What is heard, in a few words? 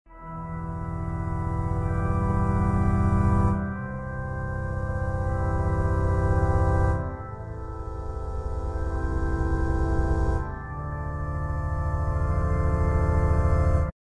horror; mr tree; sprunki